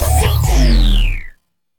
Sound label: system scan; orbit